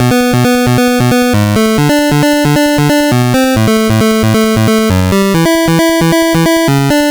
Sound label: Game Boy Bass; Nintendo Game Boy